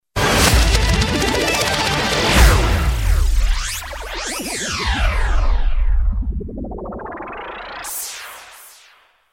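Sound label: funny SMS melody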